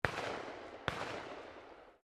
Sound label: SHOTGUN 12 GAUGE DOUBLE BARREL FIRING 2 SHOTS DISTANT, Sporting Shotguns